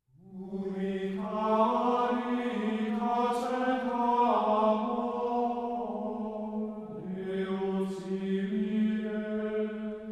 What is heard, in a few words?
Ubi caritas Choral